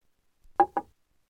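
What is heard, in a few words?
put a cup on a solid wood table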